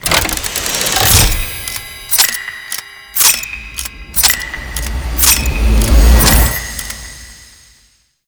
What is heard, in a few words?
War Clock Generic; Tau; Twelve Minute War; Objectives Meta